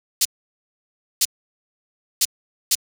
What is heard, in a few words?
HH Denon (manual); hydrogen